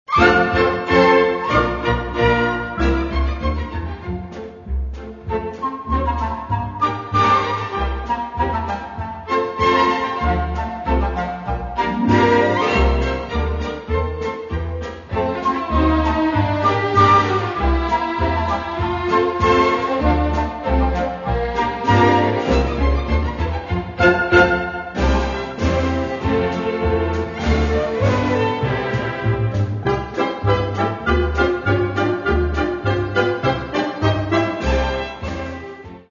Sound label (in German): Hollaender Polka